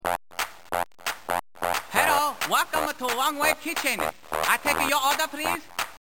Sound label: chinese chicken